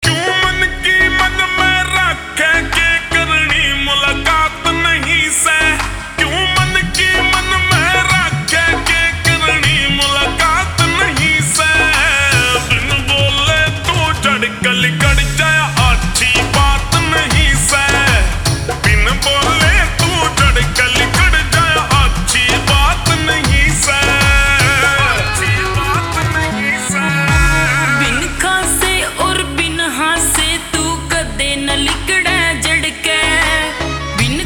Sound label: Ringtone Slowed Reverb